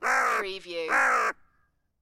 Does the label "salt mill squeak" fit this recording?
No